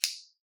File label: scissors; soundscrate